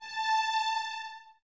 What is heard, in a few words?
Violins A 5, String Ensembles; Miroslav Vitous; LINKS ORCH